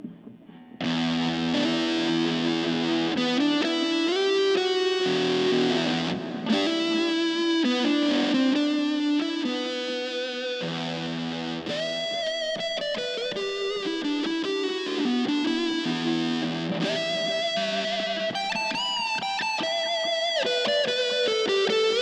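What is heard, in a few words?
crook t style blue sparkle, guitars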